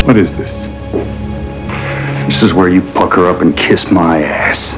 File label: pucker